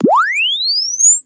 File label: press one finger Chirp; Samsung